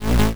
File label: zap, square waveform; Square Waveform sfx